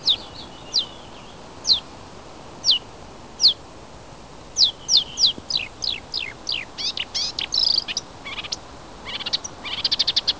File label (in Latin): Acrocephalus palustris